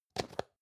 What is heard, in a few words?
plastic can small lid close medium